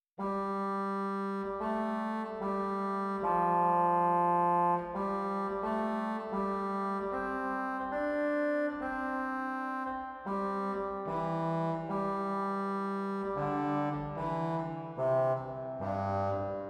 Pedaal Trompet 8; zwolle

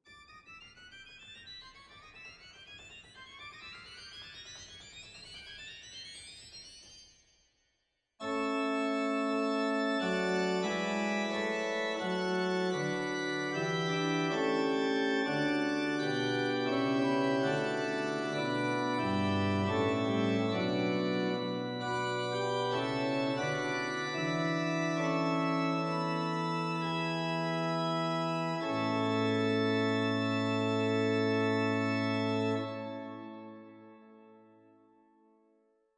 Borstwerk Mixtuur; zwolle

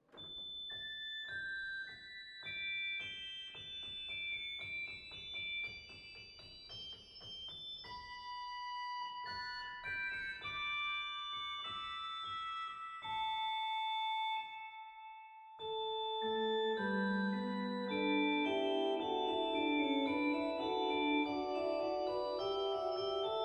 Borstwerk Nachthoorn; zwolle

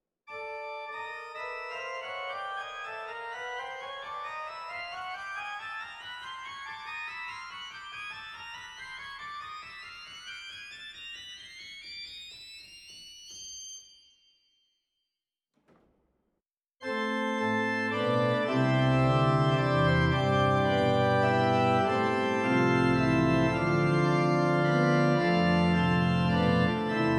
Mixtuur; zwolle

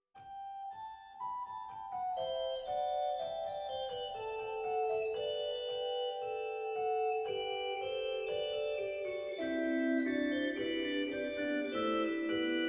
Bovenpositief Siflet 1 1 2; zwolle

